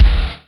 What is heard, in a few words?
BD NIN, Yamaha DTXpress Electric Kick